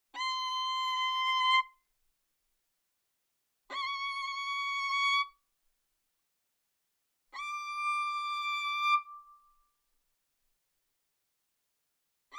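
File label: Violin arco fortissimo sul A C6 Ab6; Strings; violin2012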